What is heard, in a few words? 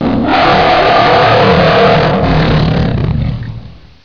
death